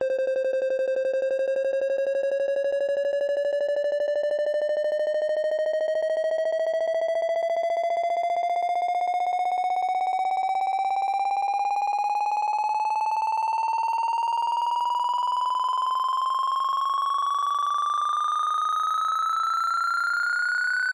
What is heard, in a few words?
science fiction warning beep constant distance warning getting closer computer; console; zapsplat